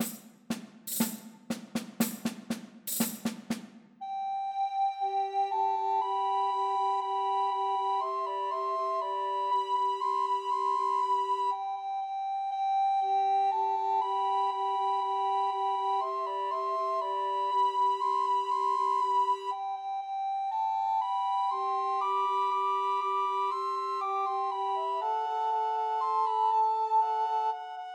Christmas Trios for Two Descant and One Treble Recorder